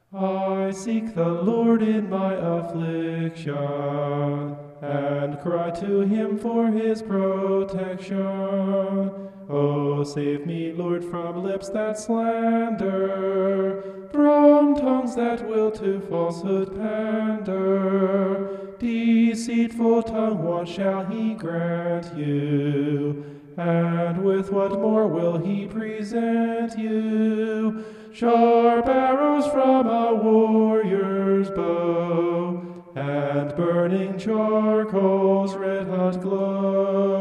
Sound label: vocal homoph; psalm 120